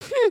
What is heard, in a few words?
sway, emotes